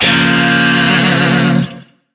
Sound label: Shawzin Hirajoshi Chord E; Music; Warframe; Lizzie Shawzin